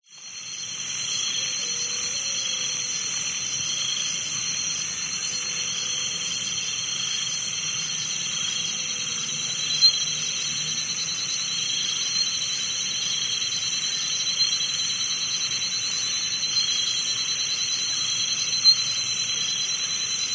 wetlands insects frogs dog and distant traffic, doi suthep national park thailand